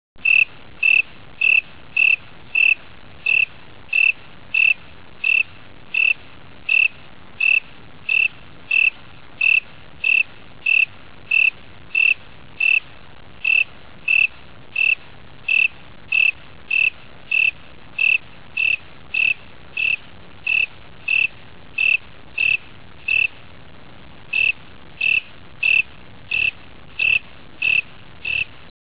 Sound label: cricket